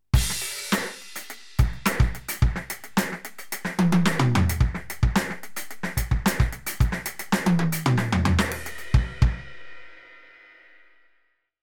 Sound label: Roland TD07 Filter Drive